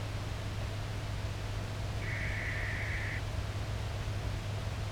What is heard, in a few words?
Barn Owl